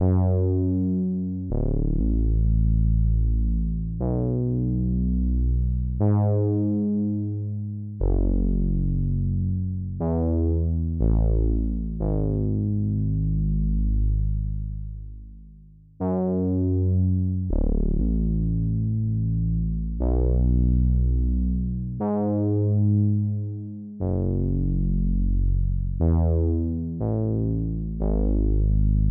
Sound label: Dream SEQ